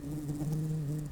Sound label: Lapidarius workers, bumblebees